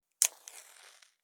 Match Flame; SFXSource; Object & Material Sounds

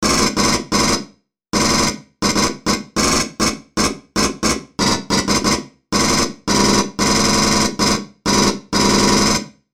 M41A Pulse Rifle; FXHome; Bullet and Gun

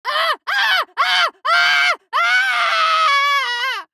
Voices Female Death Scream Burning; Funky Rustic; FunkyVoices

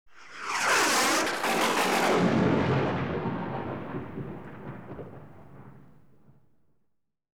Legends, Thunderbolt; BBC Sound Effects Library

